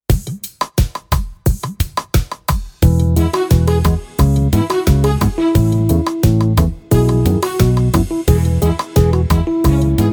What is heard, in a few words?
instrumental